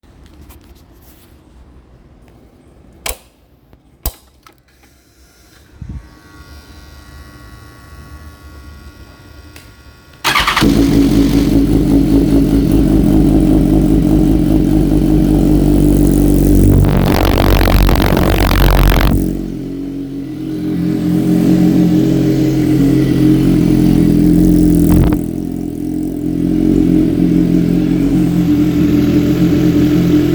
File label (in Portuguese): ligando